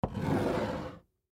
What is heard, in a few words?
Glass Cup Slide Away Drink Bar Counter; Dishware Sound Effects; Foley